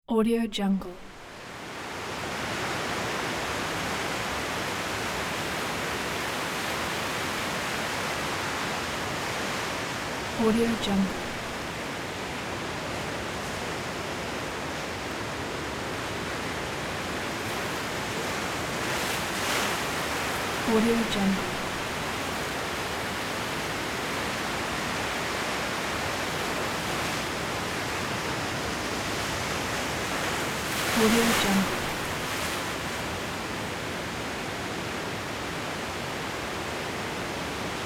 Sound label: ocean